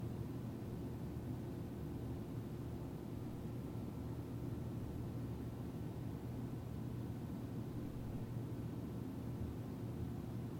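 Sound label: calm clear distant sound of factory work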